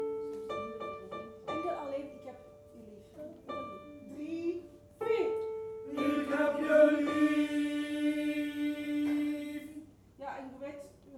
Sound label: tenor; Pastorale; SINT-CECILIA KOOR ROTSELAAR